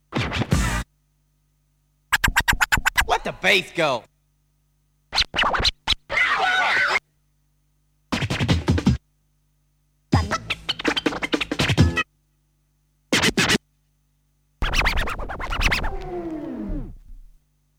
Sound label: Scratches; Kniteforce; 1000 Samples Volume 1